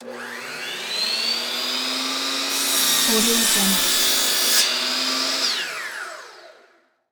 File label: chop saw cut wood; prosoundfx